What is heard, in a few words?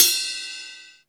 TRANS BELL, CYMBALS; CYMBALS 1